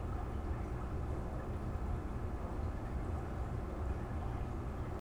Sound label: Ambient Specimen; Among Us